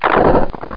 crunch; impresja

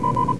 Mild Alert; Rescue! 1.6

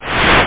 Airlock Open; Galactic Trek 1.6.1

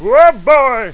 cook faint; Mean Cuisine

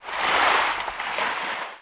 swim

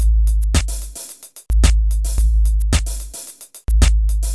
Simple(110), Beats

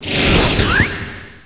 gate; Hexen2; skullwiz

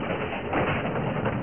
GEAR GRND; Duke Nukem 3D Kill-A-Ton Collection

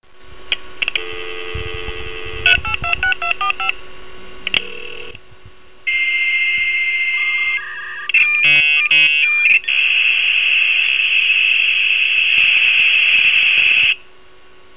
modem

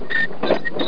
pulley

